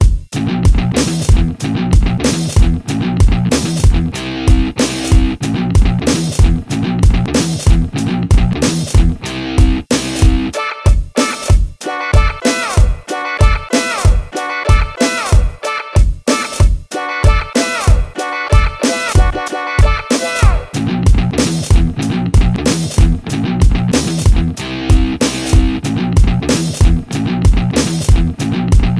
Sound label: rock and roll